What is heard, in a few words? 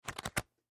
Folders Flip, Folders Flip Through Stack; Paper; Office; Blastwave